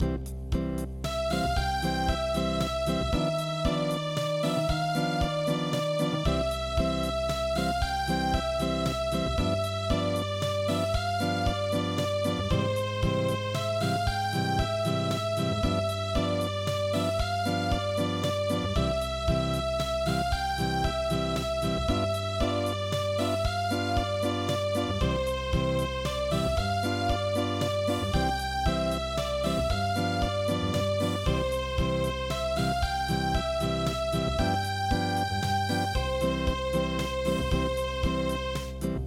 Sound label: Valse Alsacienne Acoustic